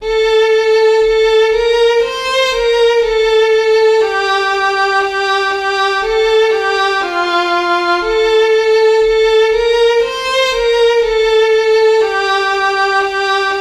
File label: tempo 60; Vodená